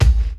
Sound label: Kick, Drums & Percussion One Shots Drums